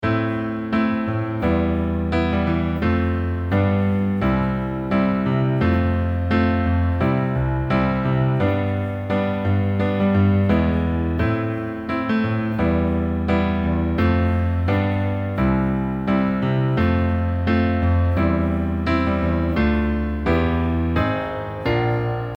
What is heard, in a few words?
broken arrange B